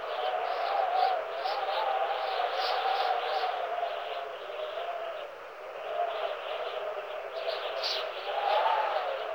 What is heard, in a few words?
Interior Wind Howl Bed Loop; Sentient Devourer